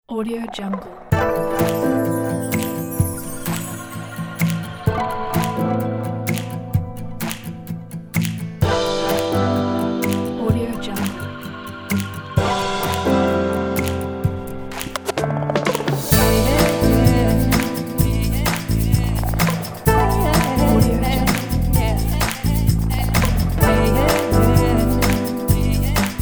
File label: upbeat chill out girl song